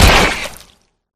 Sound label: pop; flood